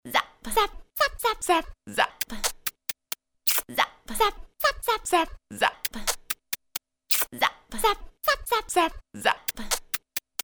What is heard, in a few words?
zap; Cortex